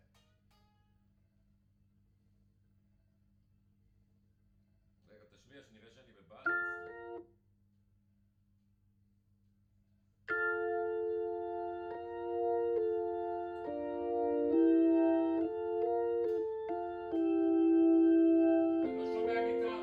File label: Hammond Leslie